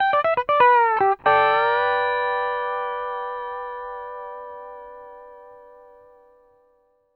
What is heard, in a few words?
R, Whole Lotta Country, ENDING120; PD ENDING120